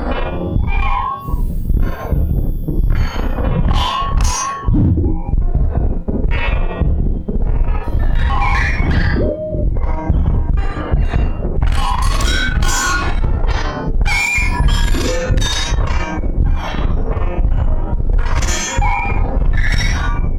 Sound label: Tension Strings; sections, moments & testimonial music for contemporary georgrahic